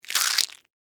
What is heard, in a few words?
Crunch Egg Shell; Texture; Vegetable; Crunches; Objects; BlastWave